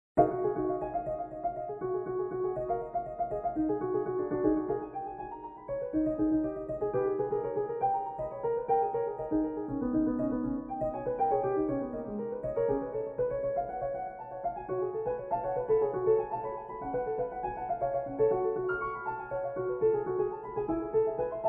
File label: piano scherzo